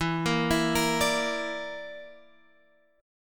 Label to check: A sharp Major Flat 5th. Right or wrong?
Right